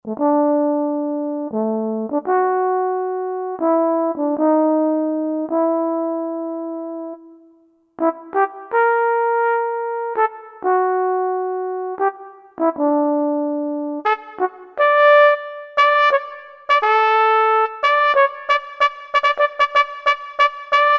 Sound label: Real Trumpet, Roland SR-JV80-16 Orchestral II